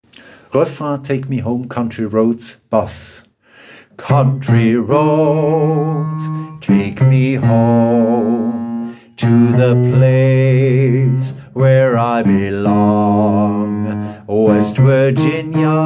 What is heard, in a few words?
Refrain Bass